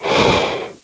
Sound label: Stalker Jump